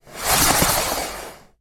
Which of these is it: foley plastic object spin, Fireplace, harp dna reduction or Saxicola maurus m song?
foley plastic object spin